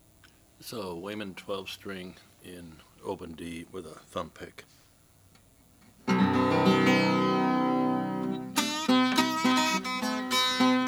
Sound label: weymann 12 string natural island stencil